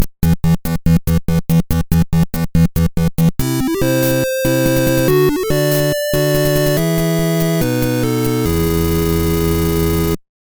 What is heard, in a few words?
Game A Battle Won; Radar Mission; GB